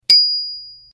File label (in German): Fahrradklingel; Elisabeth